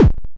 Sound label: hit; uberpong